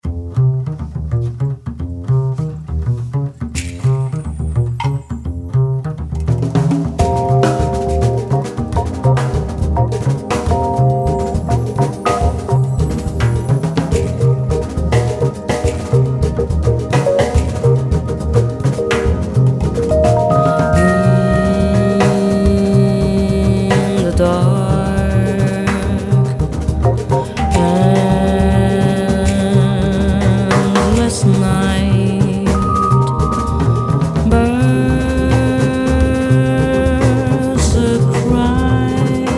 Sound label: Birds Song, piano; 40 anniv